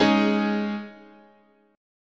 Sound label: G sharp Suspended 4th, chord